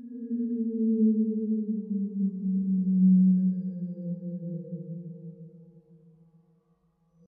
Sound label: whale; ambience; dfcaverns